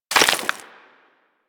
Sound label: Bullet Impact Debris Rock; Warframe; Weapons